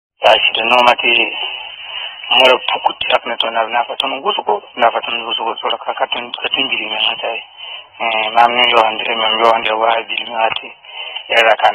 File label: Wah